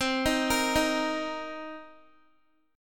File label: C Minor 7th C Guitar; Cm7 arpeggiated; x,15,13,15,15,x